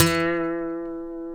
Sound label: F#, funk guitars